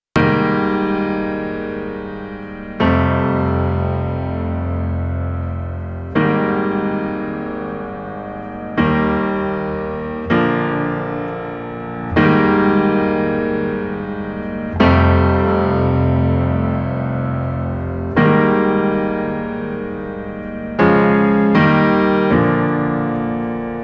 On Piano Direct Classic Then Cassette

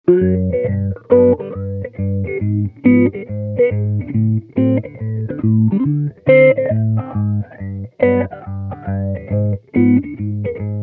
formant, mobius

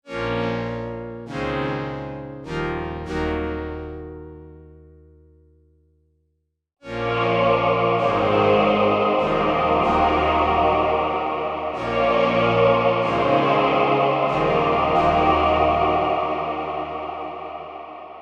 chorale, yamaha dx21

